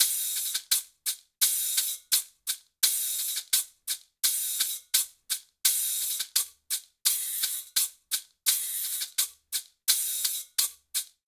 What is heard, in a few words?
Guajira Maracas Guiro 85bpm, Latin Percussion; Ableton; Factory Packs